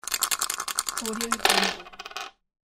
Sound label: dice